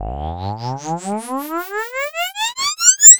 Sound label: Rise; Rise FX